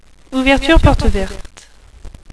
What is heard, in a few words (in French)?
porte verte